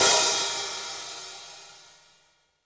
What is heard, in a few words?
hihat - opened; JazzFunkKit